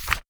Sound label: paper turn